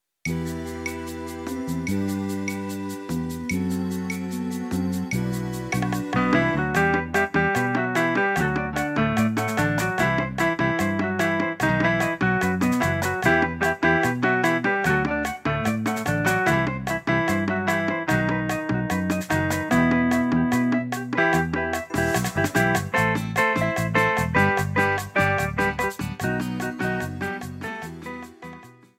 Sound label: Bachata; ritmos; latino